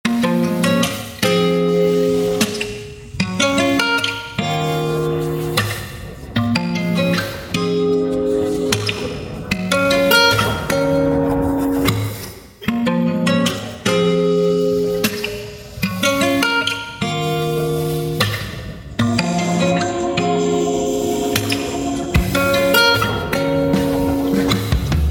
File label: instrumental